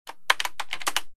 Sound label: keyboard, ships computer